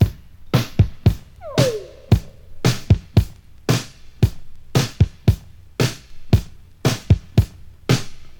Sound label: 114 bpm modern drum beat g key, drum beat - kick tuned to the G note. Loudest frequency: 976Hz; drum loop